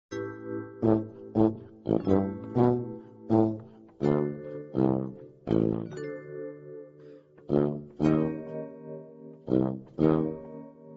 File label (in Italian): tuba; SOUSAPHONE